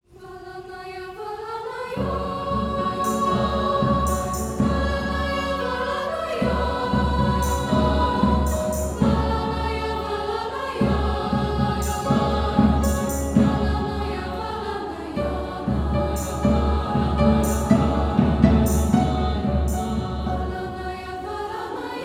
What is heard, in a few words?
Choir; Skowronki